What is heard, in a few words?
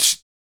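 vox percussion, Vocal Planet Jazz FX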